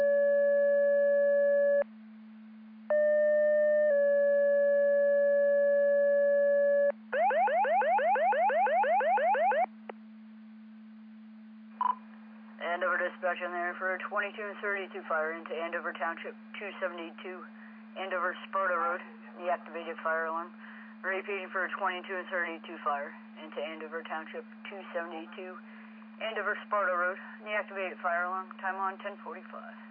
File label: Siren; Andover Twp Boro